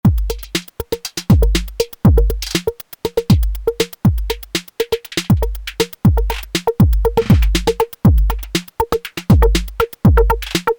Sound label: drums